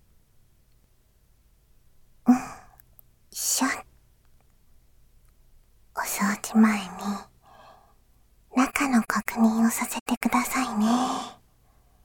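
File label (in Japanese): 耳かき左（効果音あり大きめ）, ASMR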